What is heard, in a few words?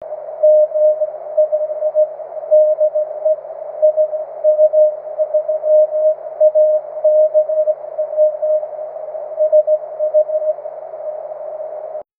cw, 23cm